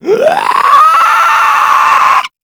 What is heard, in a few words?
Infested Runner Chasing; Warframe; Runners